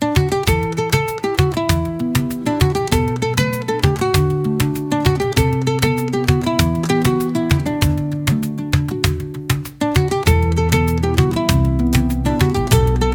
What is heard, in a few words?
Relaxed